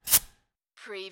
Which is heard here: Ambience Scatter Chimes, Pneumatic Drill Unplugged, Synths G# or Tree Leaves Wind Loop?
Pneumatic Drill Unplugged